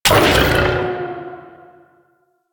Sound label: Sniper Mech, Weapons; DE; Warframe; Tenno; Quad Sniper